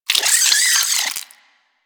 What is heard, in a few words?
vocal death, critters; Warframe; Hunting Critters; Nexifera